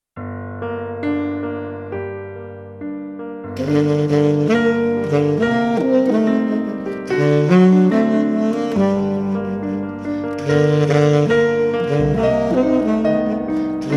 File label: melody